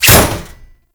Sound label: Radial Blast Impact Metal; Excalibur